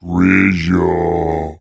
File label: Lost City Groan